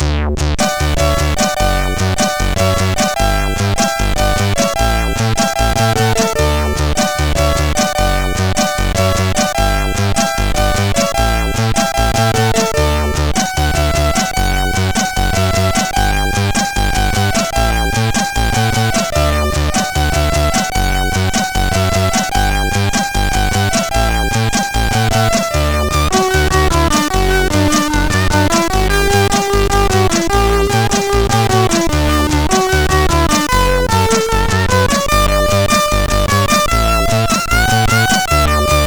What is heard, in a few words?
Rockzak, CSG8580R5